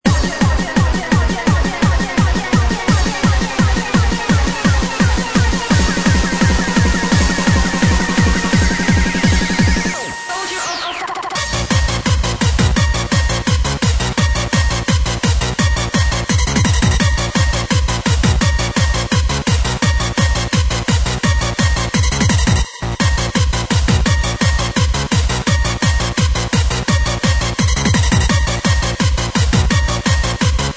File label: hybrid noize